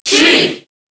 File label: Crowd; Sheik